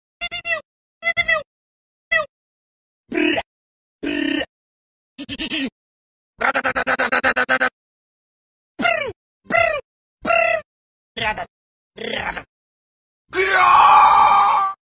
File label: FUNNY GUN TROLL PEW PEW PEW TRRRA TRRRA; memes